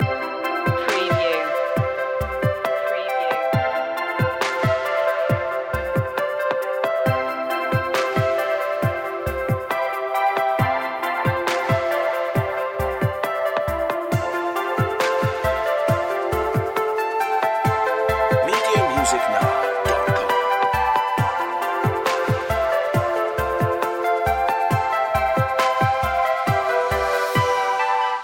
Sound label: tones of aqua loop